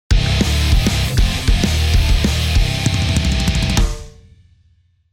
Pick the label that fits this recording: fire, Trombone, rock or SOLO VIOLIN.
rock